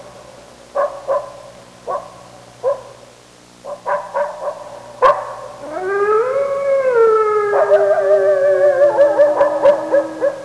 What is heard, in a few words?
lupo coiote wolf